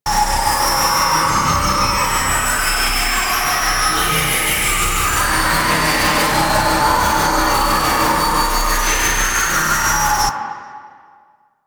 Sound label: Medusa Gaze Loop; Warframe; The New War; Archon; Nira; Abilities